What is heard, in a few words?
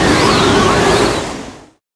Tornado Skill